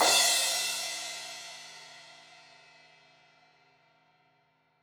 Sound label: Crash 16in edge, Cymbals